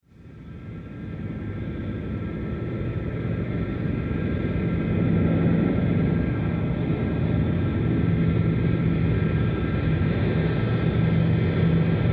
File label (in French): Passage de jet de ligne